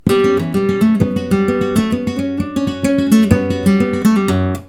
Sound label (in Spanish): falseta